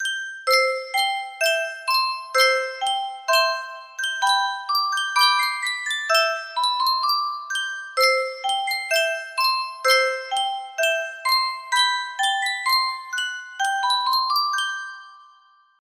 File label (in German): Spieluhr; die forelle